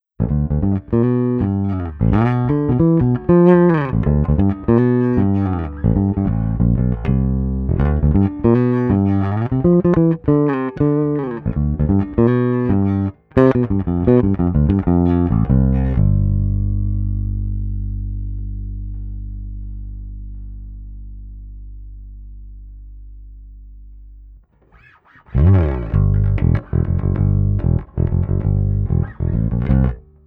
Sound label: BASS ONLY